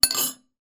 household cutlery single item down on plate or dish